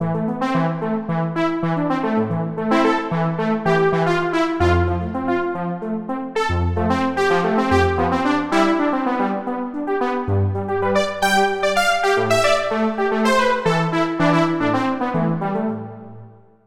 Synth Brass; KORG OASYS; oasys assault

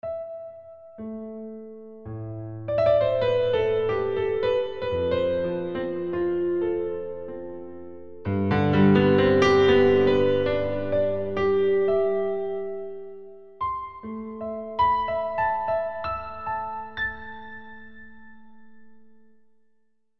piano; sample assault

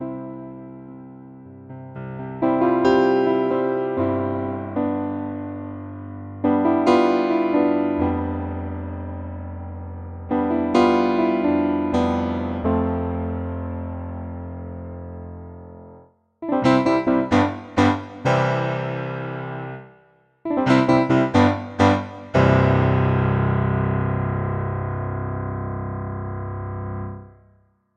Dual Layer Piano; KORG OASYS; oasys assault

